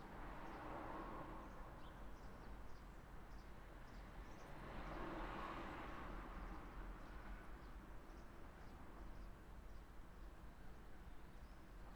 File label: AMB STREET GENERAL; SIMUZENSOUND